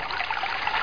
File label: tube